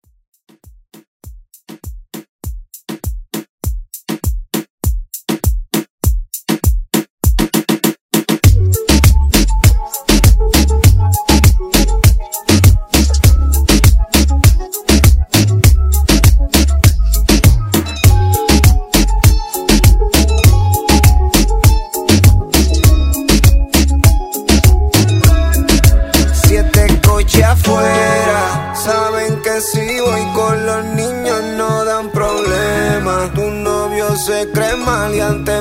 Reggaeton Redrum

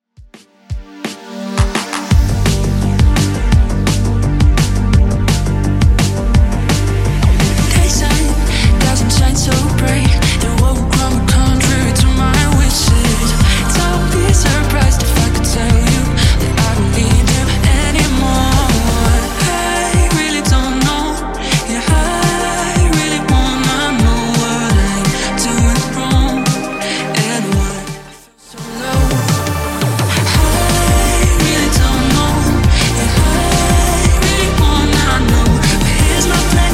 90s Redrum 170bpm

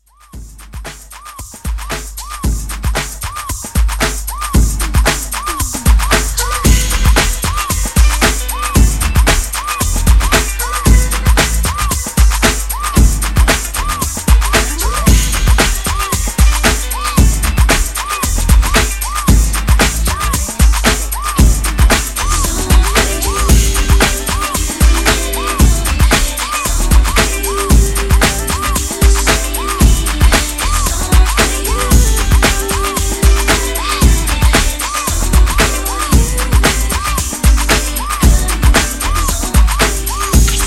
Dance Pop Re Drum 114

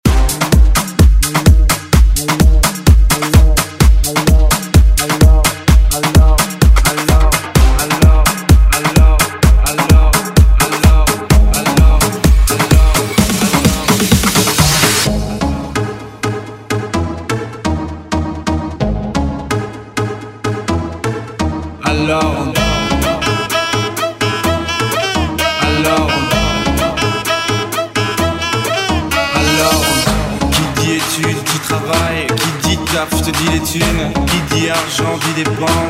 Afrobeat Redrum

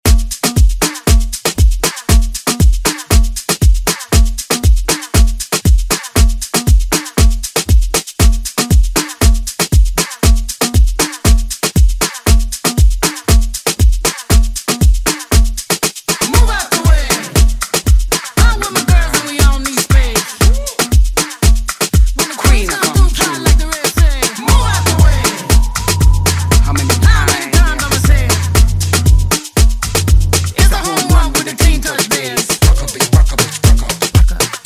Moombahton Redrum

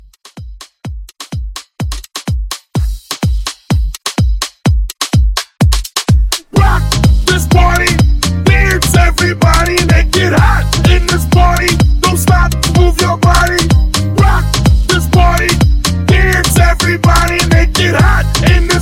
Club Latin Hype 126; Club Latin Hype Edit

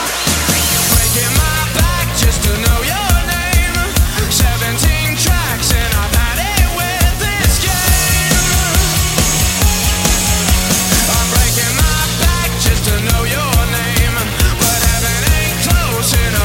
Slam End 138bpm Bbm; REDRUM

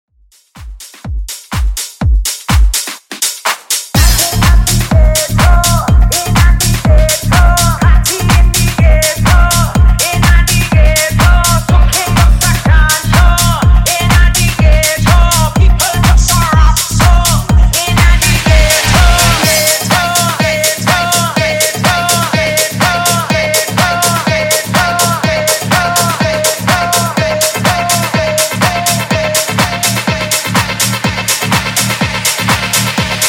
hook intro outro 124 bpm

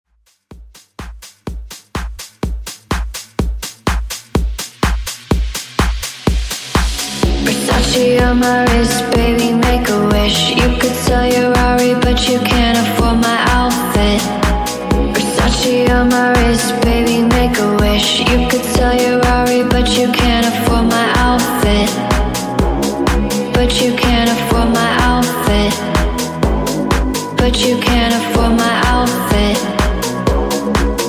Tech House 125 Bpm Dirty

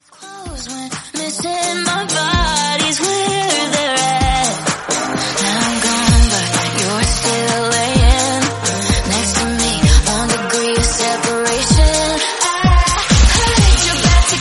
128bpm Eb; Redrum; remixplanet

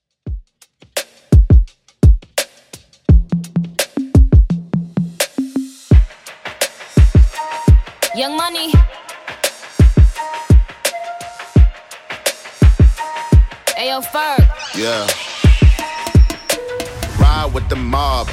Top40 Hiphop Redrum 85bpm DIRTY

